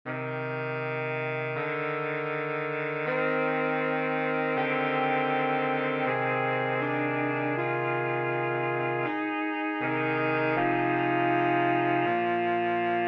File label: Cantabili MIDI